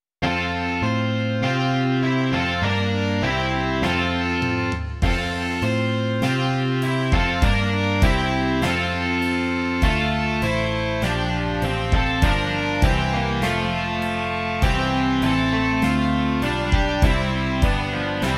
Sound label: rock